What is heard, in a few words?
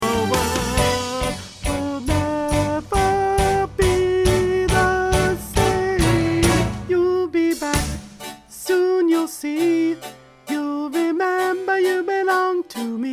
soprano